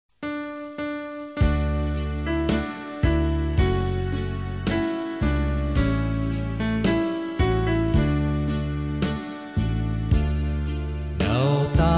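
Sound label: soprano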